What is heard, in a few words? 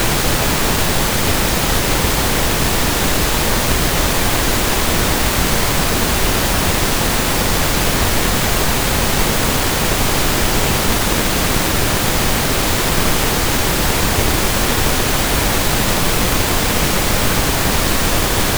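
noise pink left right mono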